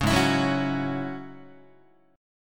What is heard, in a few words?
Ab 7th Suspended 4th Sharp 5th Ab down Guitar; Ab7sus4#5 strummed; 4,4,7,4,5,5